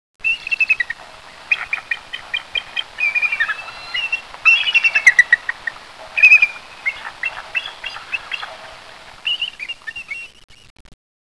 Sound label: eagle